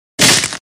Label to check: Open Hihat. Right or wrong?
Wrong